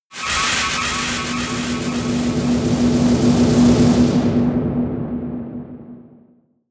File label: air screaming vortex high; laerm